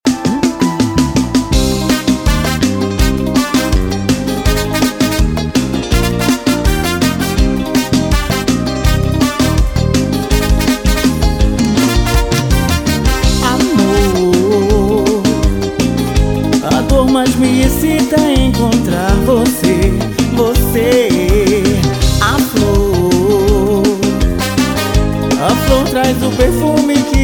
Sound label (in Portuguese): banda brega pop